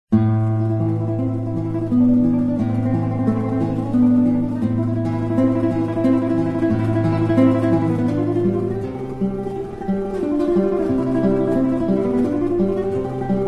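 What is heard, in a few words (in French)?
pour guitare; Souvenirs de l Alhambra; Tarrega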